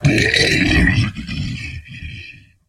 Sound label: die, aliens; ortnok